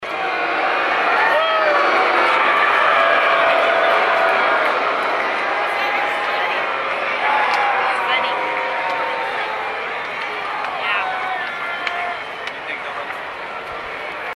Ballpark Boos

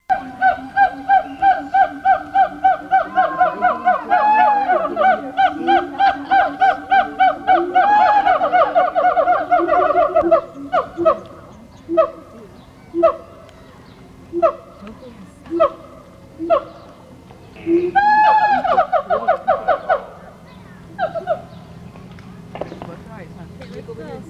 Monkeys; Howler Monkeys

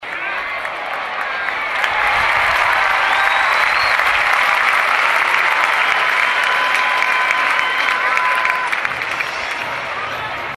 Light Applause

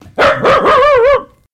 Scotty Bark

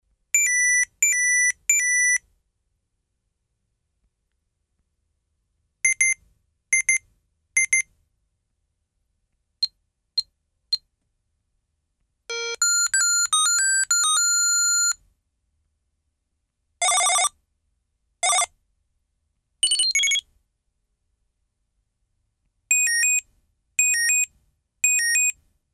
PDA Alarms

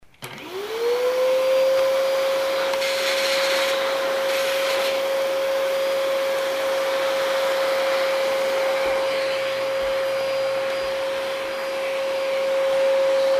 Vacuuming

